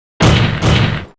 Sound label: double slam; hemlock